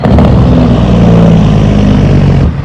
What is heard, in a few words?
spatial truck